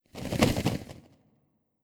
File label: Hawk Flap